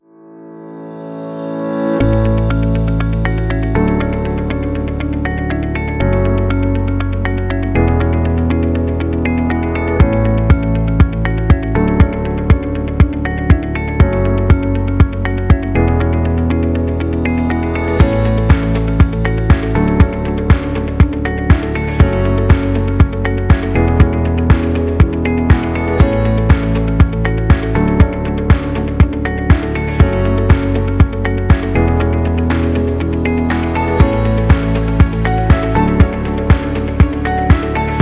Simple Innovative Corporate Music

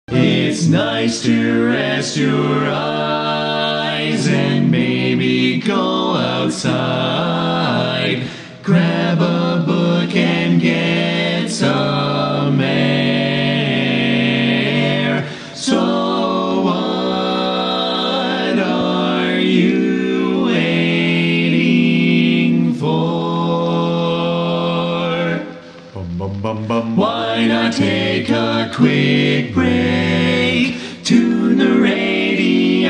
Barbershop Quartet Song; Cuphead

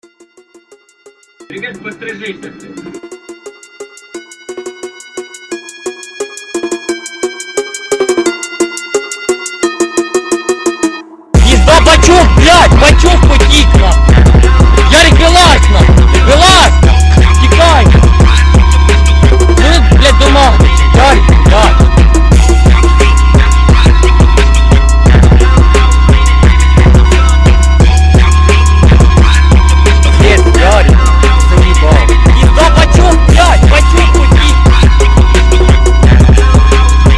PHONK

